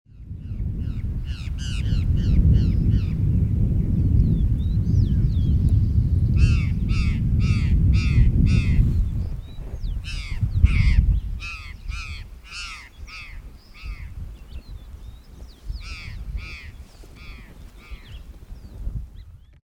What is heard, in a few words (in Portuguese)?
Cyanocorax cristatellus Gralha do campo